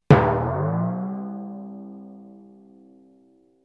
Comedy and Cartoon Sound Effects Bounce Low Tympani Bounce Up